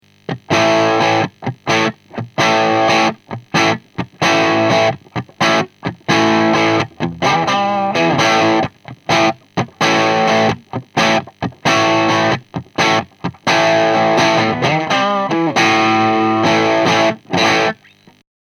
P90 dark, Canal 2, P90 position bridge, tone réglé "sombre"; White Jack, un 3 canaux; AMPLI

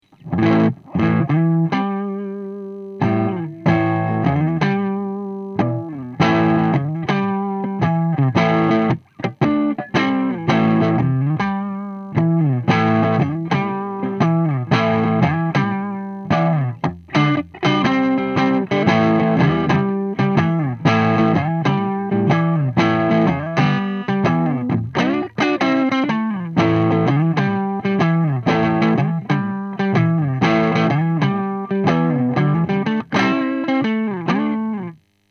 SH4 tone clean, Canal 2, SH4 position bridge, clean; White Jack, un 3 canaux; AMPLI

